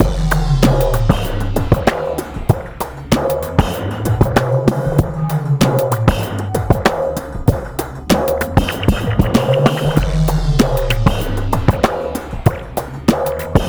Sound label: 96.3 bpm, Breaks; 90 s Sample CDs; Planet of the Breaks